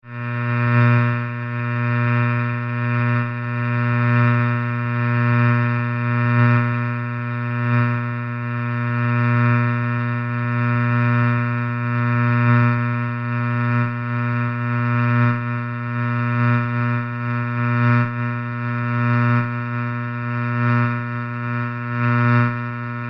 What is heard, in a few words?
Electric High Buzz Pulse, Oscillating buzzing electric hum; Electrical Sound Effects; Electricity sounds; Lynne Music